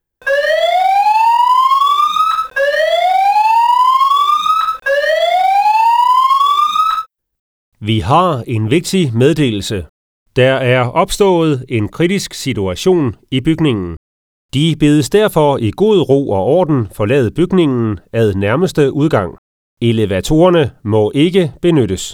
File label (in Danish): Beredskabs Alarm